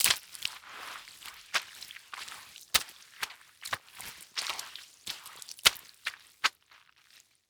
Liquid Water Mop Wet Mopping Sloshing Concrete Floor Fast